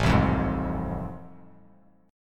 A Minor Major 7th Sharp 5th A, Bass Standard; strummed; AmM7#5; JGuitar

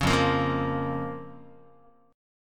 F Suspended 4th Sharp 5th, down, Guitar; strummed; JGuitar

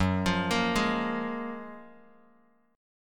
Gb Major 9th, down, Bass Standard; GbM9; arpeggiated; JGuitar

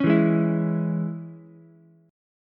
Db Minor Db Ukulele; Dbm strummed; 6,4,4,7; JGuitar

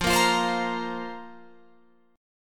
F Suspended 4th F down Guitar Open F

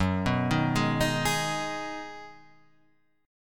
B 6th F sharp, guitar chord

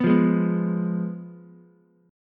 G sharp Minor 6th G sharp down Ukulele; G#m6 strummed; JGuitar

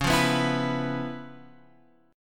Db 7th Sharp 9th Db down Guitar Open F; Db7#9 strummed; JGuitar

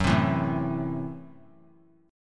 Gb Minor 7th Flat 5th Gb, guitar; strummed; 18,19,19,19; 3C,3F,3A,3D; JGuitar

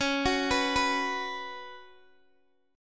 C sharp 7th Suspended 4th C sharp, Guitar Open D

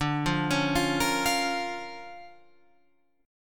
D Minor Major 7th Sharp 5th, D, Guitar, Open F; DmM7#5 arpeggiated; JGuitar

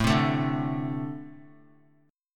A-Minor Double Flat 5th A Guitar; Ambb5 strummed; JGuitar

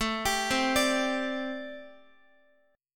A Minor 11th, Guitar; Am11 arpeggiated; x,x,9,12,12,10; JGuitar

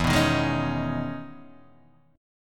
E Major 13th down Guitar DADGAD

